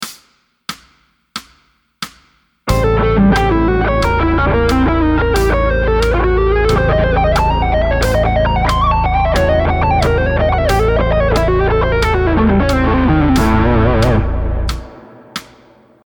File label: Lick